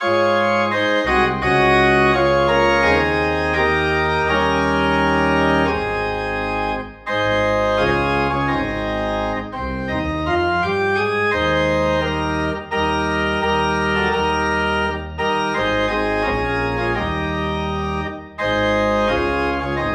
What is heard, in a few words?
Organ in A Flat; Go Ye Into All the World